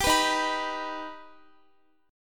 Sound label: Eb Augmented Eb, Guitar; strummed; x,x,17,16,15,18